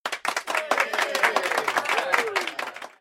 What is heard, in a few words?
congrat clap win